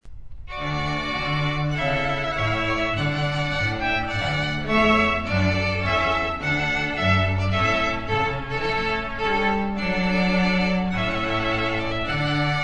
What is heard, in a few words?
Trumpet